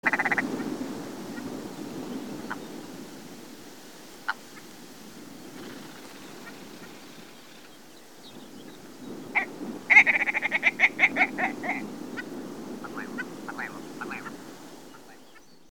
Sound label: Willow Ptarmigan, bird calls